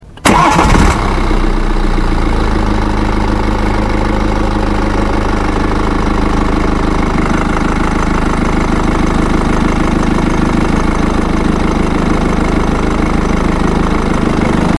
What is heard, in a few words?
520d Ignition